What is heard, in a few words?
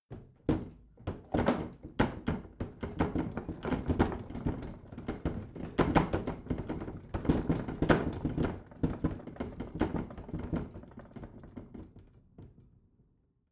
bathroom cabinet rattle; objekte; tueren